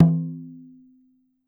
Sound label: DRUM, Ritual Drums; E-MU Producer Series Vol. 7 – Old World Instruments; Drums&Percussion